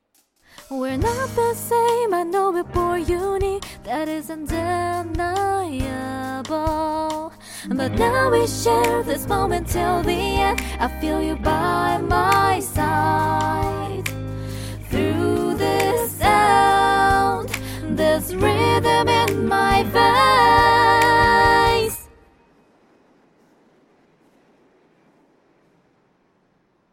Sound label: music nc; 1000Xseries; headphone